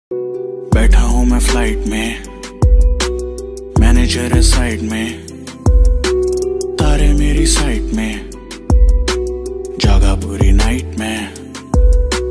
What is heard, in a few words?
hindi rap ringtone